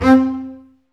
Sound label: STR CB, Cb Bowed, Cb Marcato